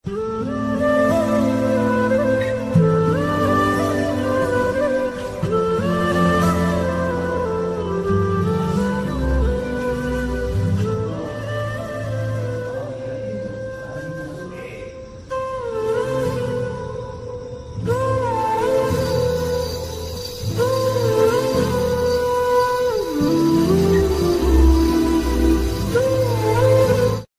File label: romantic music ringtone, bollywood